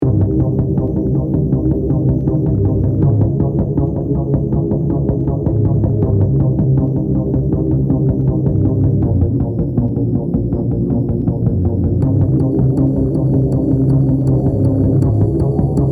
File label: Clocks Count Down